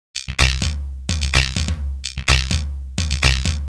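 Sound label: percussion, Drum Loops 127bpm, Nu Dance Electro; Zero-G; Soundclash Nu Dance Electro; Acidized Wavs; Leapfrog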